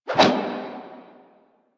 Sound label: Melee Swing; Warframe; Caliber Chicks minigame